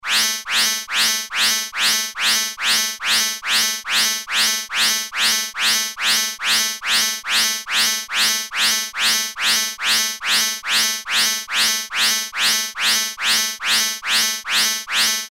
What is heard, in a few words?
Sci Fi Alarm Warning Signal Loop; Control Panel; Blastwave